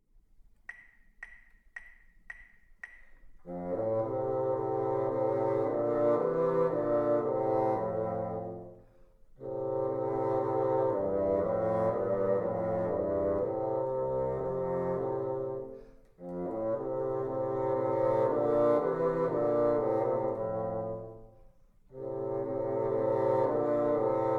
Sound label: together, bassoon